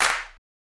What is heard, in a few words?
Clap